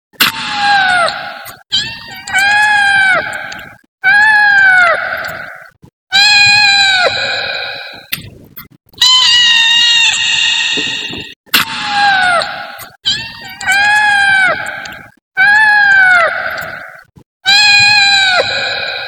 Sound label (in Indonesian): suara kucing